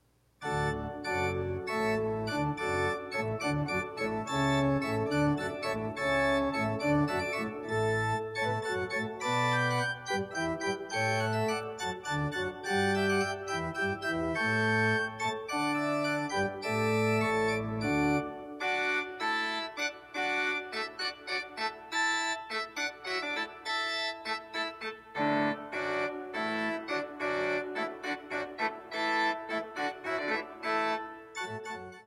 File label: Hornpipe